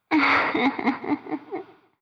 nfufufufu

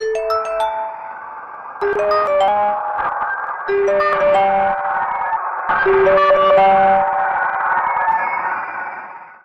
cyakushin

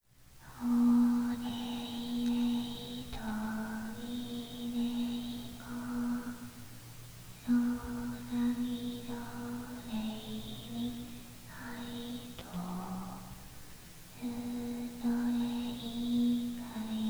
warabe; ひっそりしたわらべうた; うつろな目で静かに歌う でたらめで意味のない歌詞です

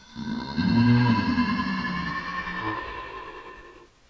umeki; うおおおぉぉ; うめき声 魔人・怪物

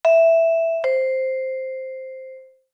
pinpon; チャイム１; ピンポ〜ン 普通のチャイム１回

